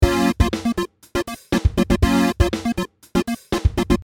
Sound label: synth choirs; TAL